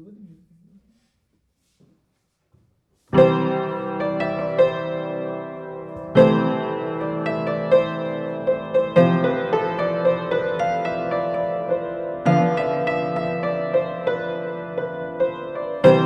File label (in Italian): pianoforte dentro